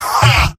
laugh short; scout; mvm

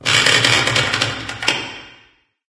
amb wood creak, ambient organic; video games; source engine; portal2

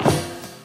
metal grate, footsteps

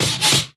taunt bunnyhopper hop; video games; source engine; tf

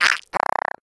uh oh; player

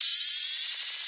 hunter flechette pre explode

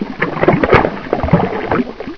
sack; video game; source engine; hl1; gonarch

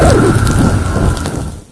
flame off; Source Engine; bshift; garg

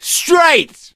positive noise, gambler, survivor voice

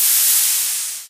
sentry upgrading steam, weapons; tf

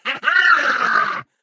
ballbot jump

